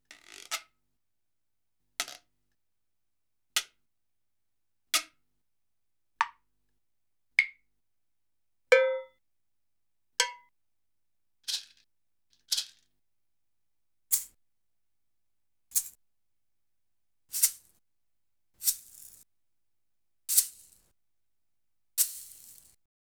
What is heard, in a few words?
Salsa Picante; Masterbits; World Zone VOL-3